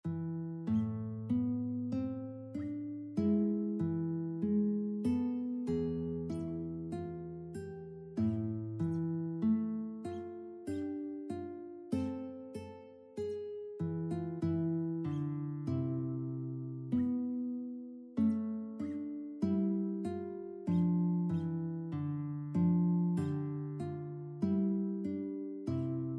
guitar

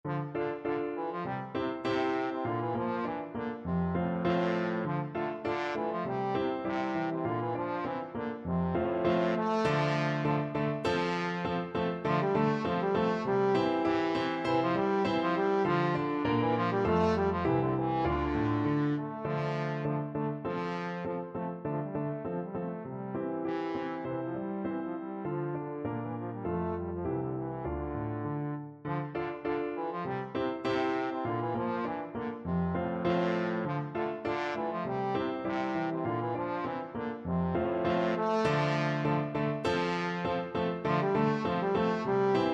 playford parsons farewell, trombone

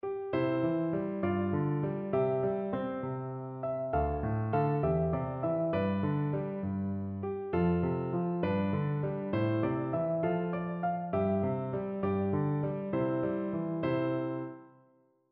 plaisir d, piano; martini

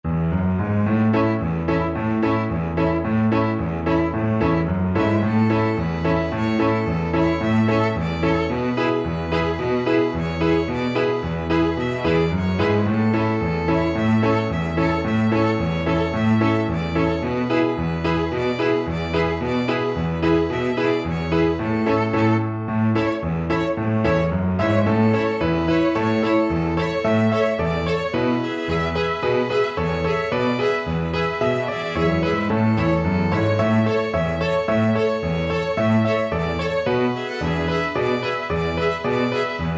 piano quintet; i have a dreydl

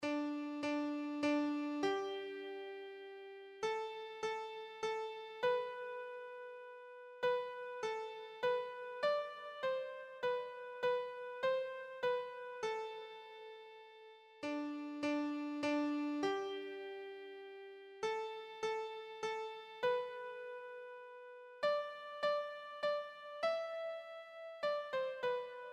come holy ghost, keyboard

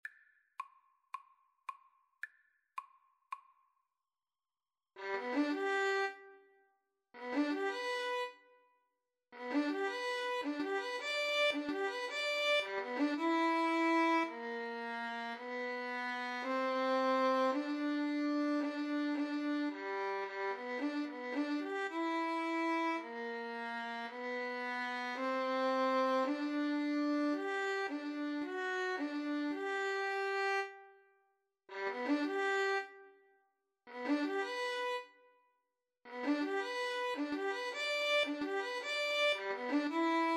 wedding march violin

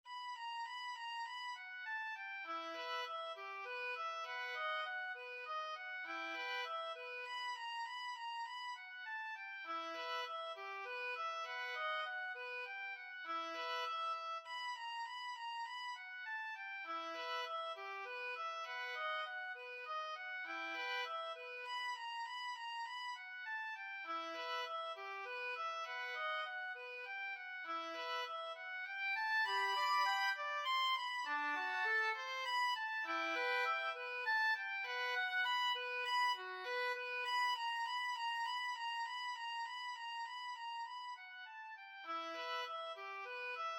beethoven fur elise, 2 oboe

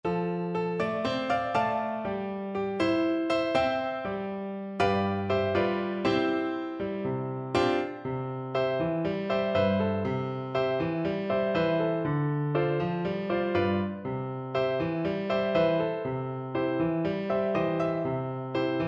FL kar, Flute

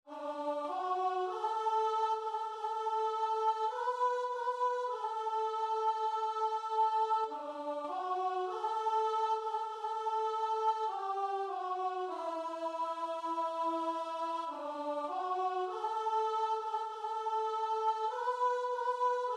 Kum Ba Yah, guitar and vocal

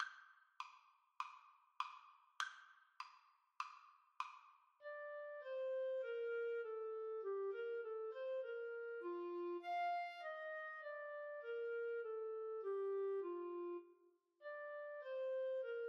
Clarinet duet

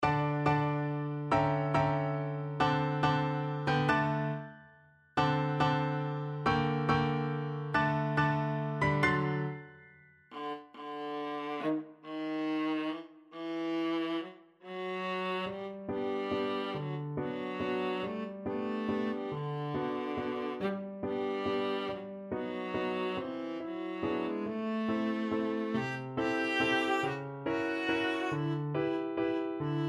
black swan waltz, viola

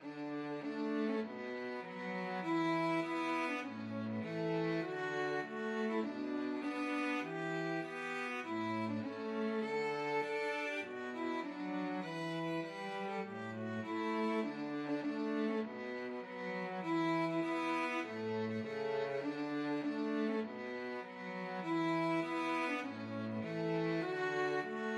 violin cello duet

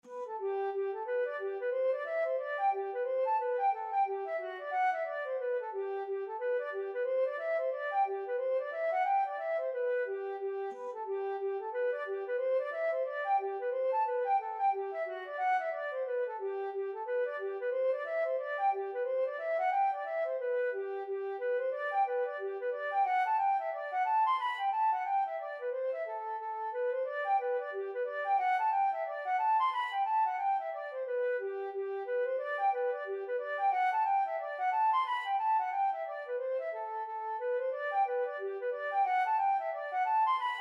hornpipe flute

